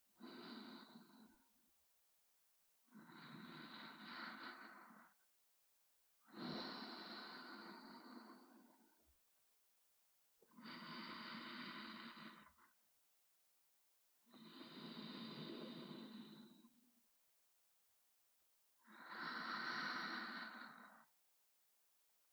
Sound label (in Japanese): 寝息ループ音声（正面）